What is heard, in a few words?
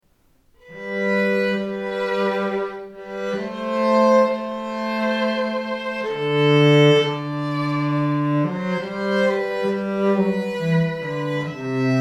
Sarabande duo